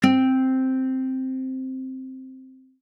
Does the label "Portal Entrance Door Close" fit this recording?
No